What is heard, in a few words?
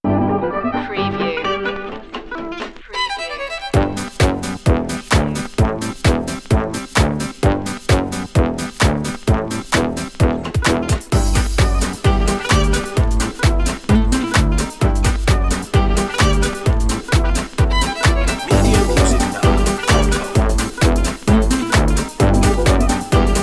doo wop bop